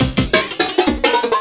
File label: Pitch 170bpm, Drum and Bass Breaks, FX Drums; EZ Rollers